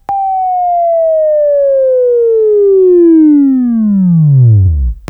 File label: WAV Right, Sweeping; Sweeping Training program